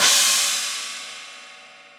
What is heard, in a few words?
China Session Dry, Cymbal; Drums; Drum Hits; Standard Hits; Session Drums Studio